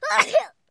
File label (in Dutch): niezen